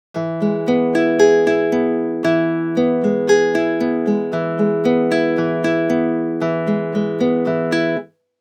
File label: guitar after Little EQ Low 180Hz -5dB wide; manual equalizer